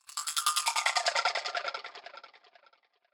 Sweep Percusion Texture

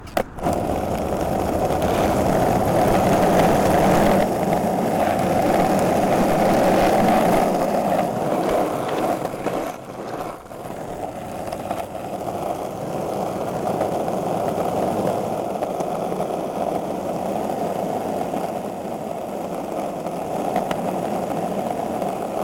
Skateboard on street

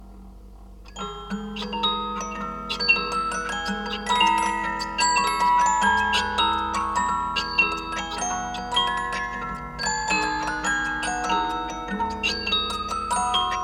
Musicbox

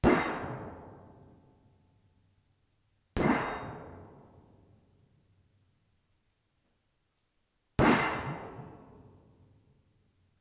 Impacts

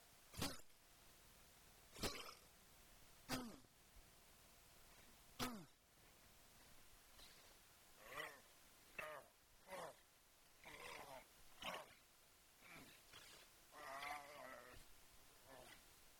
Grunts

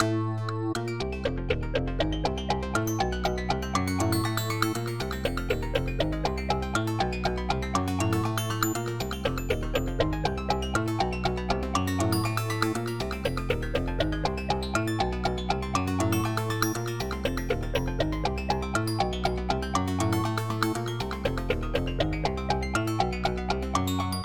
Weird Click Organ Groove

